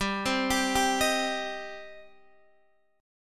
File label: G Suspended 4th Sharp 5th, Guitar, DADGAD